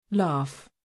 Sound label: laugh